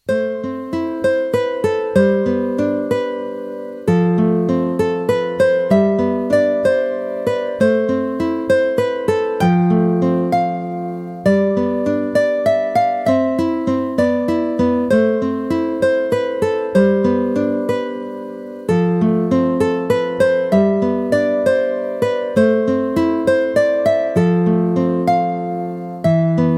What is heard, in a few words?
Falling Leaves